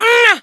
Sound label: DAMAGE; Pulse Racer